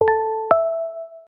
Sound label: best nokia 7 ringtone, nokia sms; ariel